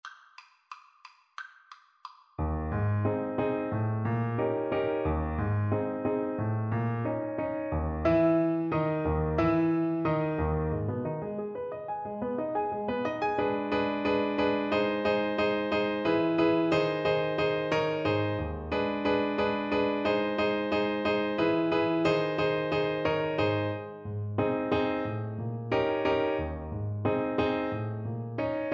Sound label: Saxophone